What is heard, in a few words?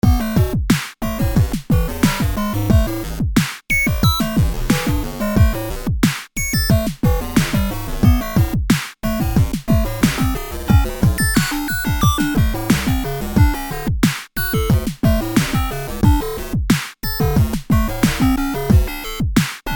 electro elektro bib blues